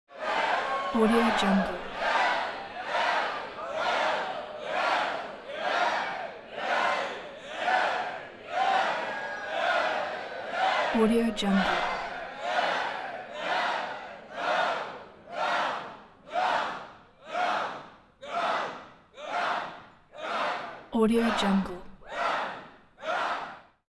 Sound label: large crowd of 100 cheer or chant